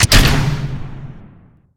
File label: sound decoupler fire; Kerbal Space Program v1.0.4